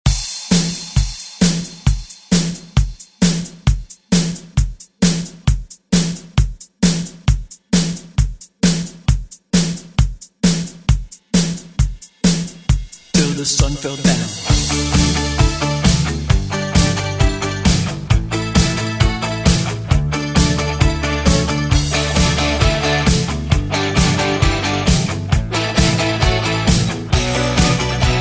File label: 80s Re Drum; DJ Mhark